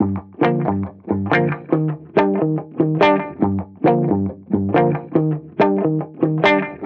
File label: Reggae Guitar Loop 140 BPM G Min; Vibrations; Cymatics; Vibrations Guitar Sample Pack